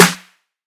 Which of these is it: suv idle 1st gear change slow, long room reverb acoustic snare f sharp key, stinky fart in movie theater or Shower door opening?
long room reverb acoustic snare f sharp key